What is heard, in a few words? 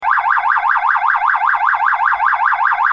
Alarm; TAS5720L; Ouput Waveform Issue; output p/n is common not different